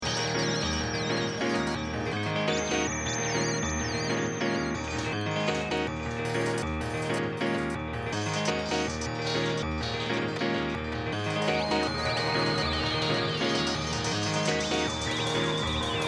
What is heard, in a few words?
piano rave; grime; new years grime